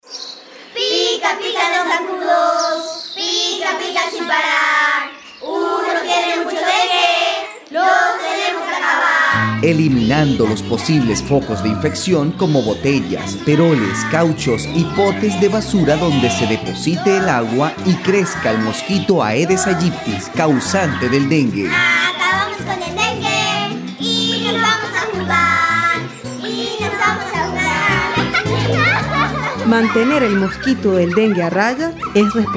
canto zancudos; eliminar depositos dengue

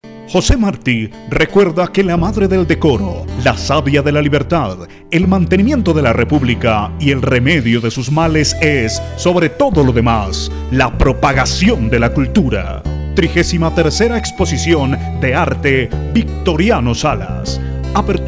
mezcla

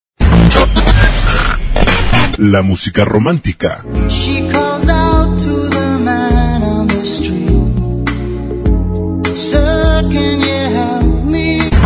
exitos adulto contemporaneo

